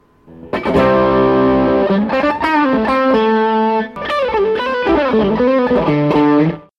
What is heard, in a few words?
TC Drive